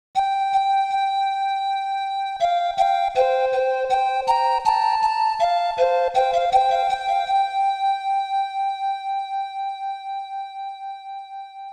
Flute of Sleepwalking; Estpolis Denki I II Super ROM Cassette Disc in Taito Vol. 1

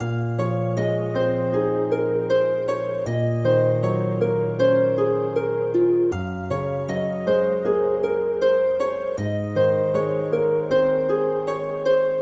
BGM ADV SHORT LOOP; aster tatariqus android ios mobile gamerip 2023; BGM ADV TYRFING SHORTLOOP

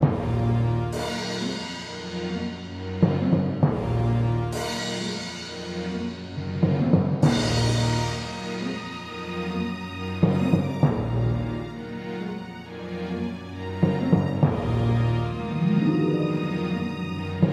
Melody; PixelJunk Shooter; PS3

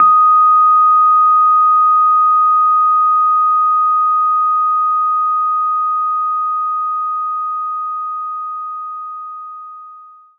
Rhodes Eb5

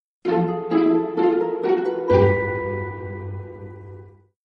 lose sound; Arc of Templar

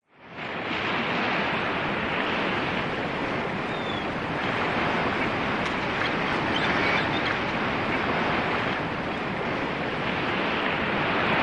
ambient shore

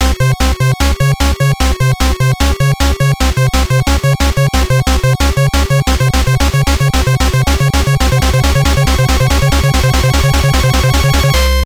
Drowning; Sonic the Hedgehog NES

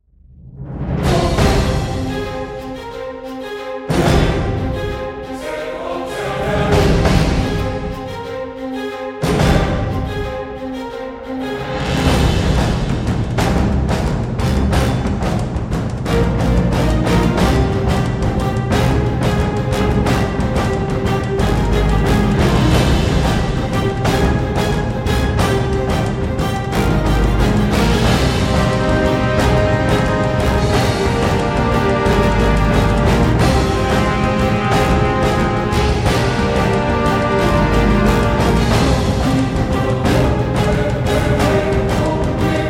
Music Level Castle; Half Loudness

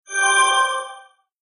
magic item sound; Caravan Beast

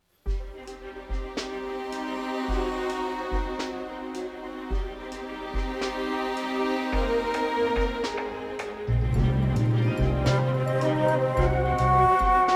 Sting 108BPM 4-4; Evil Genius 2 World Domination; PS4; Xbox Series X/S

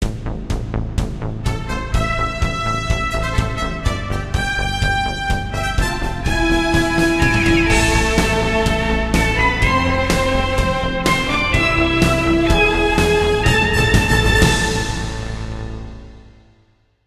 Armax Arena Match Start Music; mass effect 3 citadel gamerip 2013

